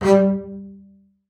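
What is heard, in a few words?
marcato G3 right, bass spiccato; Xsample VOL-5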